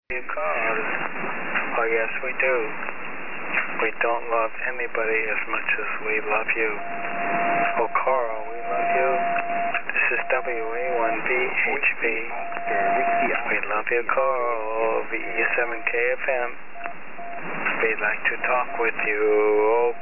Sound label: singing